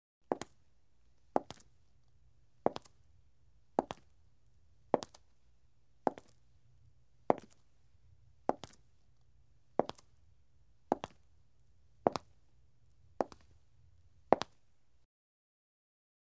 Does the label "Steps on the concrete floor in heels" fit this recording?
Yes